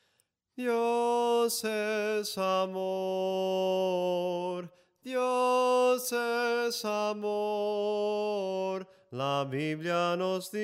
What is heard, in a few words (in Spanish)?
SOPRANO CANTADO, himnos en melodia soprano